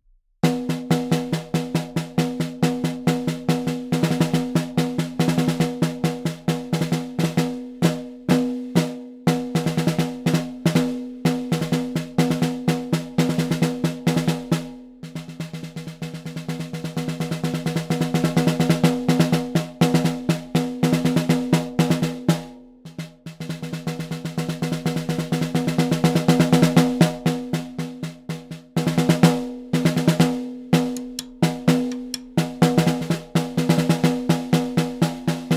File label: hot licks fast